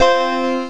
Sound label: Macintosh II Startup